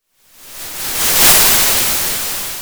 Sound of waves crashing on a shore

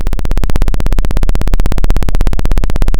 Sound of a plane taking off

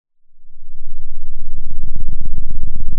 pop, Sound of an engine revving

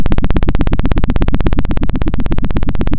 Sound of a helicopter

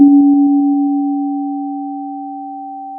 Sound of a church bell

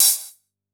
Elec Op Hat, drum

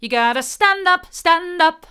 you've gotta stand up hard, vocal

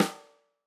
Tam Rim, drum

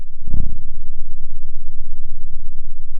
pop, Sound of a cat purring

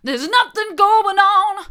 theres nothing going on hard, vocal

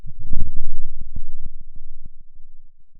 pop, Sound of a dinosaur

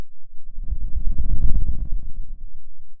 Sound of a dinosaur

